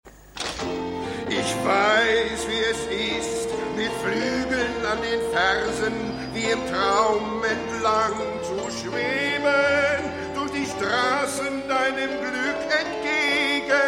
Gesang; AusgerechnetAlaska

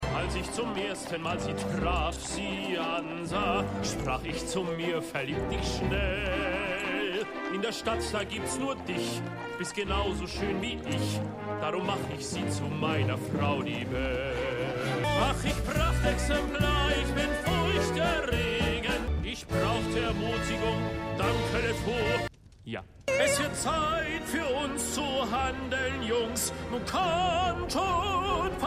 Gesang; Gaston

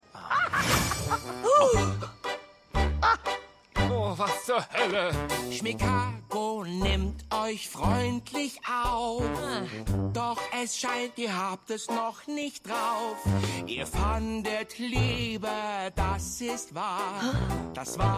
Kobold Gesang; Schmigadoon

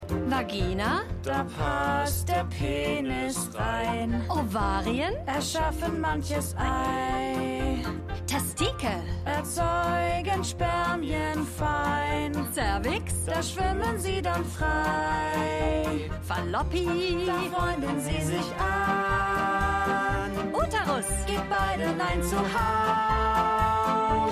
Nancy Gesang; Schmigadoon

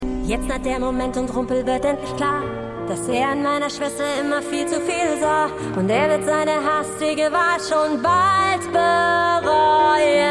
Gesang

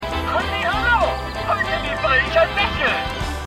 Hello Tomorrow!

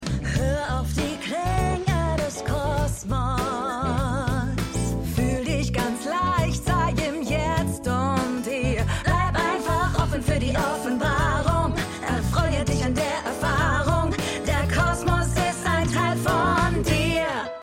Gesang; Schmigadoon; Gesang; Gesang

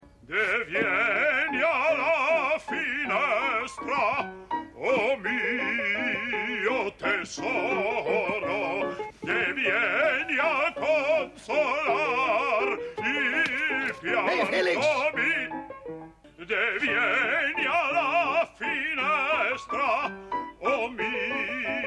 Gesang; Herbert Murphy; Maennerwirtschaft

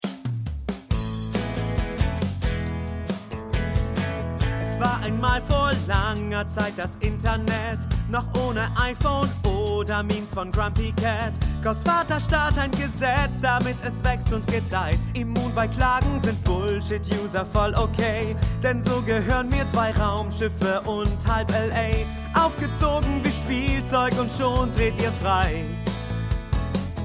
Gesang; The Good Fight

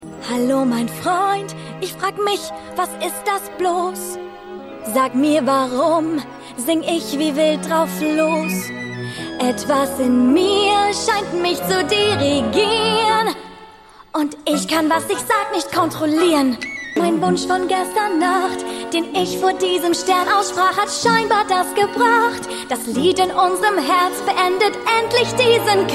Gesang; Mary Margaret Blanchard

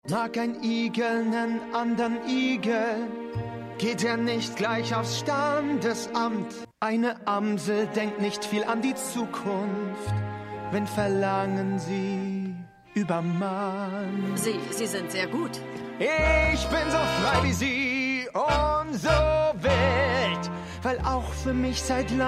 Gesang; Schmigadoon; Danny Bailey

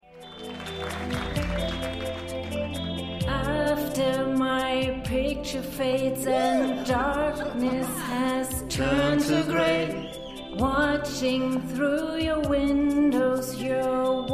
Gesang; Samantha Margolis; Goliath

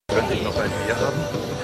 Bierschlucker; For All Mankind

